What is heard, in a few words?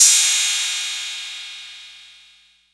CRASH CYM 801; E-MU Formula 4000 Series Vol. 3; Analog Odyssey